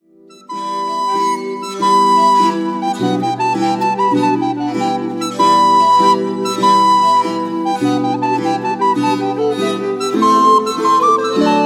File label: abbots bromley horn dance